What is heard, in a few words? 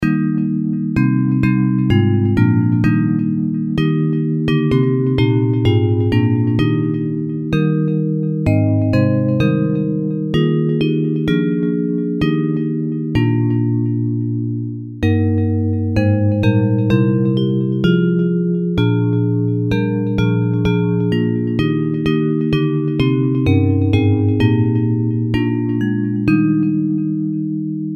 Bells, Traditional hymn; Fairest Lord Jesus